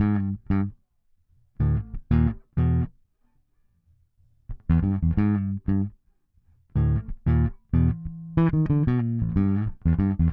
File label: Bass Hip-Hop